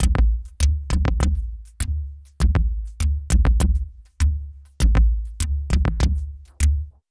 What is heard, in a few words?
Venus Land, Ethereal Textures, Beats; Sonic Foundry (Sony Creative Software); Ma Ja Le Ethereal Textures